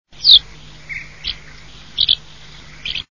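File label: crag martin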